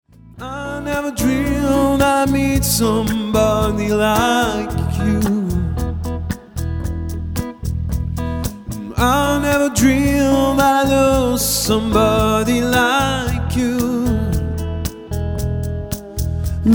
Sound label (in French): Basse Essai; W game